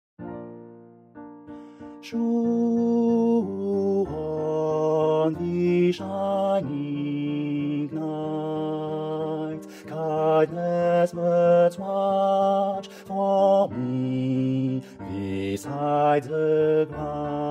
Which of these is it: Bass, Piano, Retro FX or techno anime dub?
Bass